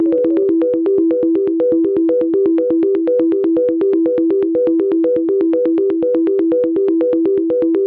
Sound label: 122 BPM Arps C maj A min Tonic E; arp essentials; Flux Arps